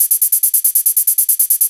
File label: HIHAT TEKNO 140, Techno, Drum